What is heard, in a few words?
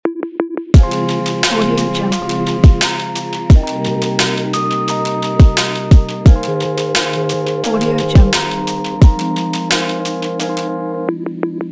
hiphop podcast intro